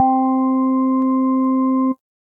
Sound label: Vinyl Drawbs, Piano & Keys; Ableton; Factory Packs; Chop and Swing